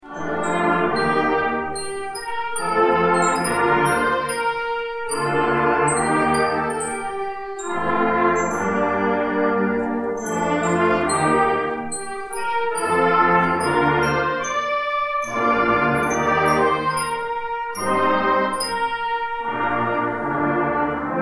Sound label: Chorale; Jupiter; Concert Junior